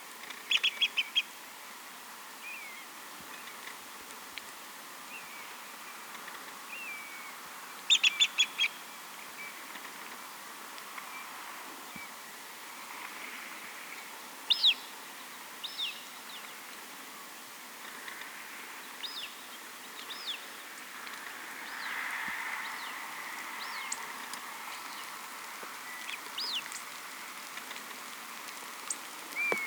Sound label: Circus spilonotus; Oosterse bruinekiekendief; roofvogels; Accipitrinae; Accipitres; Accipitidae